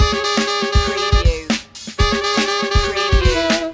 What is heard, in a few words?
funky feet stinger